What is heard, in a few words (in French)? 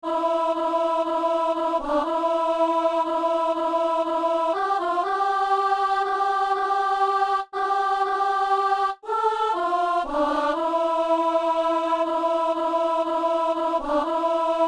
laudate dominum, alto; Messe du 17 janvier à Saint-Denis